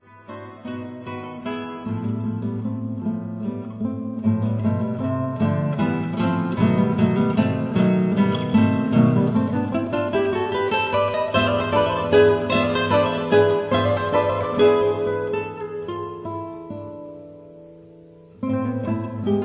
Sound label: 4 Guitars